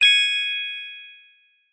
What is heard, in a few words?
multimedia notification chime bell